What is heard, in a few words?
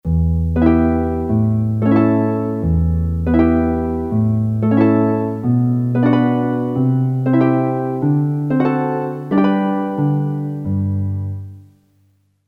Octaves; Flutes & Harp